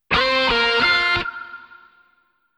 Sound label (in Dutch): fluitlogo, jingles